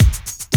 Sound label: Loop, Roland; Sound & Vision - Gigapack I